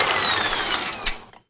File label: minigun idle end